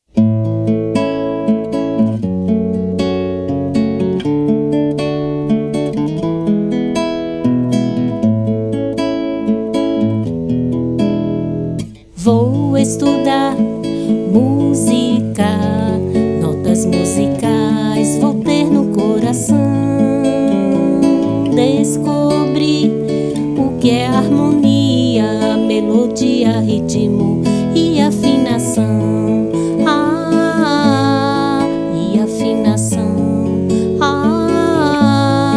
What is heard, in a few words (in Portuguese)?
CANTO MUSIQUINHAS